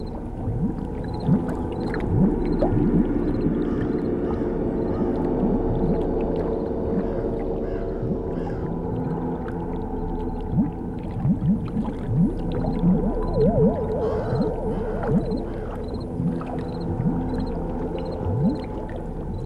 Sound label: Ambiance - Alien